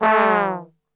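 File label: brass trombone; Best Service; Brass Super Section; 90 s Sample CDs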